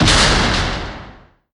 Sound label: Gear Teeth Hit